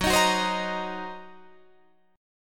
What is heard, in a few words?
Fsharp 6th Flat 5th down Guitar Drop D